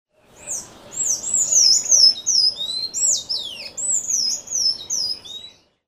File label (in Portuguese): Sanhaçu do coqueiro Thraupis palmarum; Jacauna